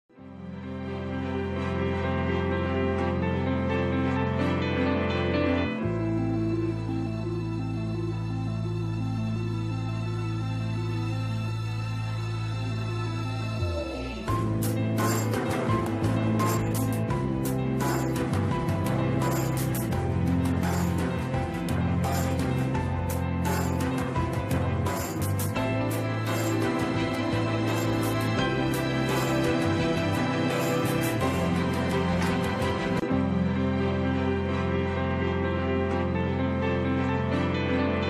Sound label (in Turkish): Enstrümantal fon müzik; Kadın